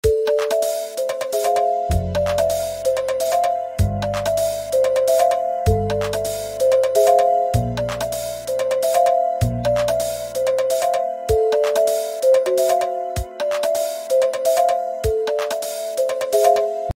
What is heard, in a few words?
Iphone 15 Loud Ringtone